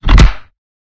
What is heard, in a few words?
Click; maomao; Asobi